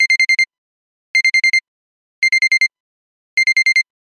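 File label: alarm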